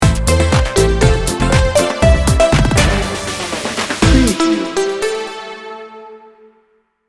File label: time to party sting bumper